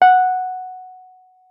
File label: WURLITZER F#5; Xsample VOL-1; WURLITZER RS